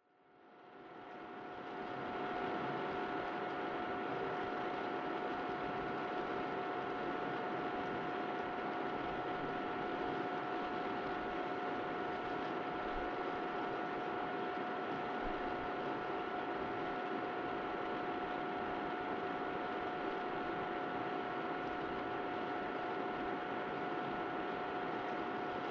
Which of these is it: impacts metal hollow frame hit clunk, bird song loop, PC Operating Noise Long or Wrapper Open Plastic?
PC Operating Noise Long